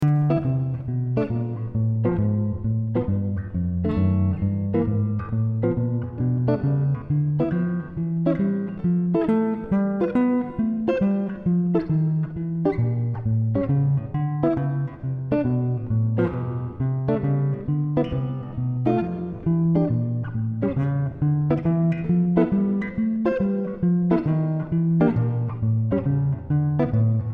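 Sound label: Walking Bass; jazz tunes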